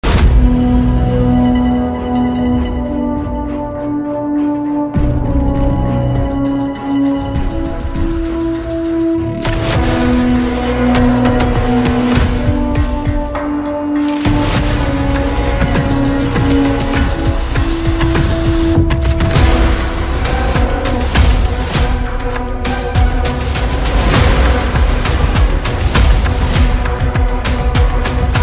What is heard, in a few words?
telugu background music bgm; liger